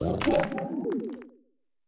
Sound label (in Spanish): Grito; Houndstone